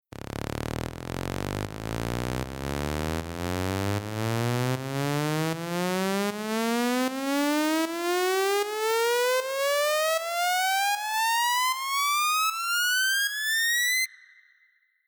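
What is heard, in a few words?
FutureBass Mix FX Riser Lead EQ On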